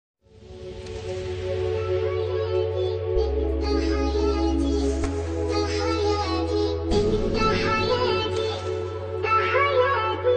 attitude stylish bgm ringtone

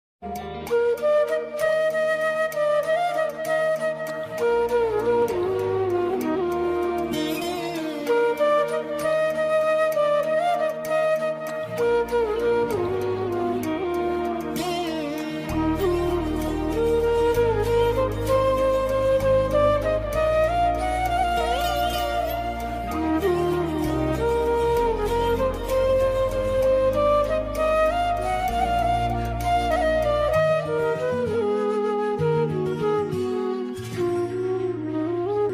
flute bgm father's feeling bgm; viswasam movie

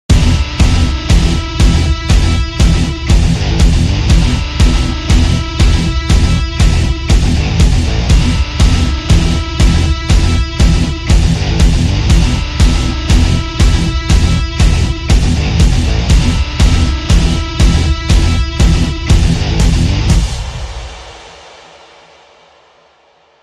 Rifle Warning Bgm; Animal

